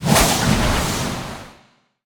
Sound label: Attack Wind